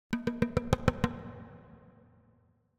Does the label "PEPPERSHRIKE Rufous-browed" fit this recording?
No